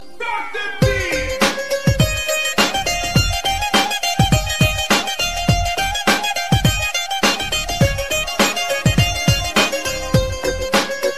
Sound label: Start The Beat